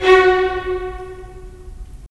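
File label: strings violins, right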